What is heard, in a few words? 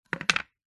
sport cricket bat set down on hard ground; zapsplat